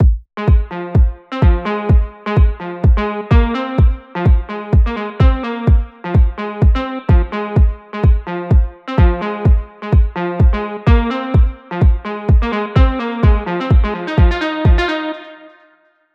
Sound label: First Pattern with Kick